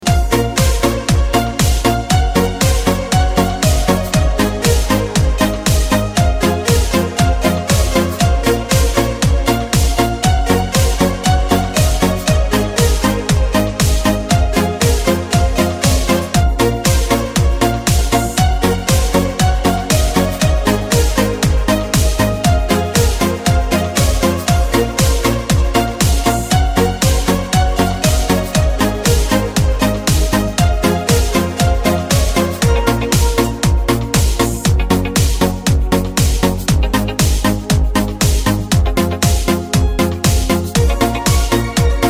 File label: euro disco music